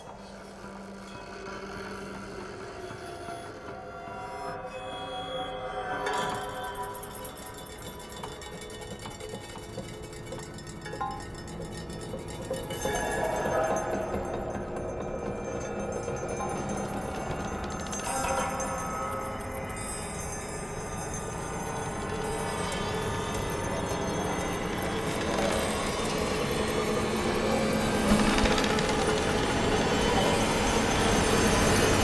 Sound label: ring resonate resound stereo